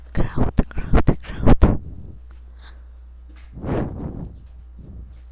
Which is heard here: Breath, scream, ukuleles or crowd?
crowd